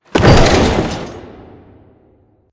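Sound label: Assembly Plate Lift Impact Metal Distant; Boomtown; Titanfall 2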